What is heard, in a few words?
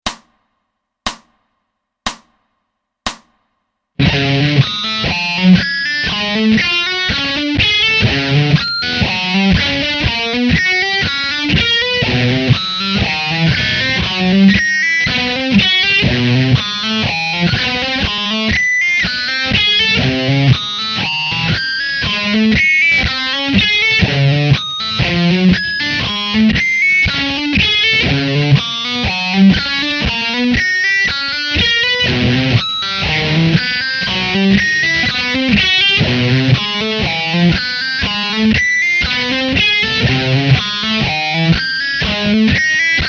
Arpeggio Etude Slow